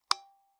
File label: Taxi Meter